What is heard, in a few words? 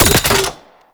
Grenade Launcher Mech; Weapons; Scaldra